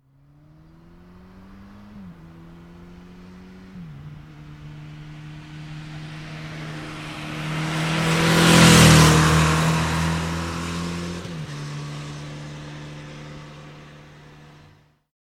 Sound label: Motorbike Suzuki 750 Up and Past Fast; Motorcycle; Tom Hutchings